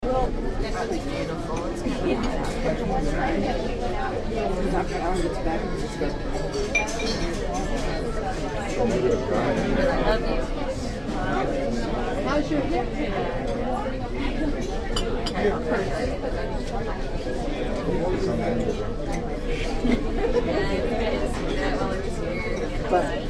restaurant crowd; ihop

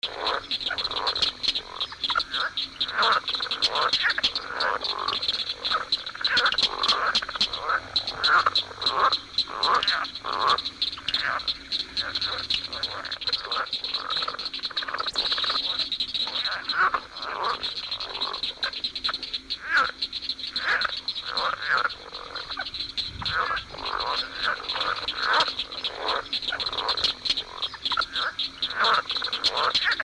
frogs southern leopard frogs

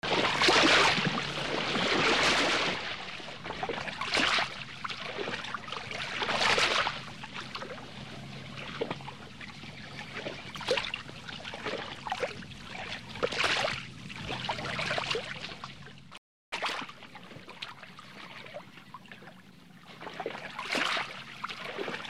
water erratic ripples and birds

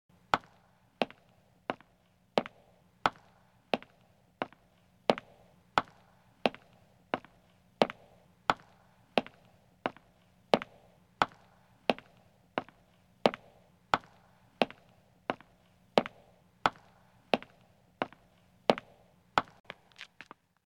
footsteps man stone

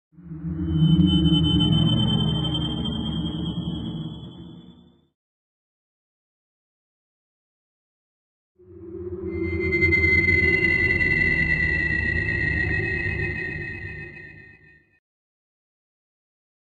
scifi crystal caverns set

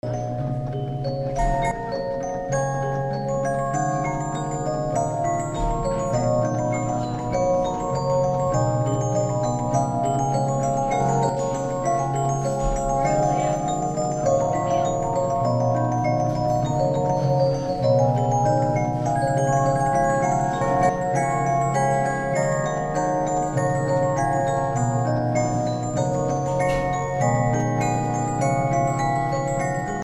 chime box; san jose

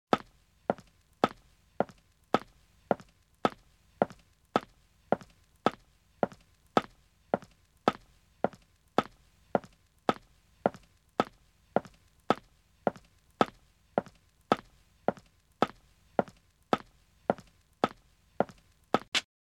footsteps man pavement

